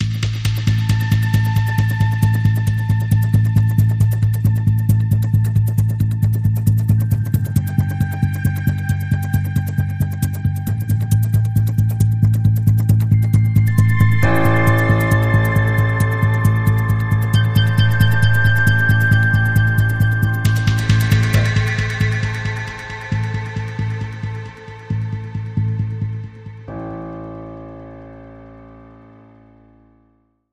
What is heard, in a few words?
Underscore